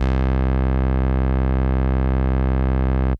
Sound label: Synth Prophet Bass C; One Shots; Electronisounds